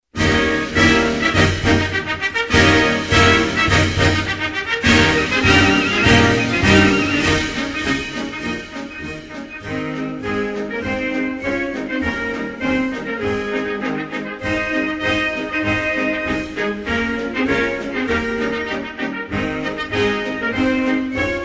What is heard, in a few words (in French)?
Monaco, Hymnes nationaux